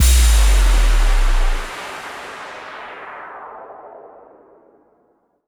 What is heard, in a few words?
FX Impact